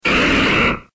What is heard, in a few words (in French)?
Cri; Cri d'Ectoplasma dans {{Jeu XY}}.